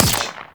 Drone Rifle Fire; Weapons

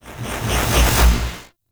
Transmission In Head Out; Transmissions In Your Head

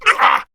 Pain, Male; Warframe; Grineer Male B; Battle Barks

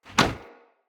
Kick Impact Wood; Envoy

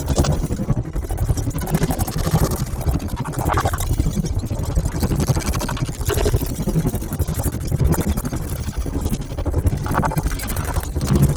Sphere Loop; Warframe; Gyre

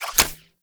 Pump Shotgun Reload Shell; Weapons; Warframe; Zariman

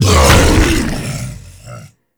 Bowl Stop C; Warframe; Grendel

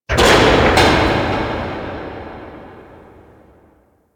Gas City Distant Metal Latch Huge; Warframe; Corpus

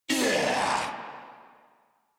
Death Corrupted Grineer Male

